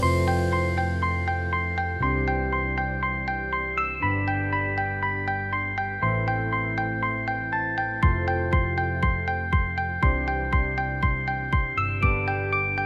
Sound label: ringtone, indian melody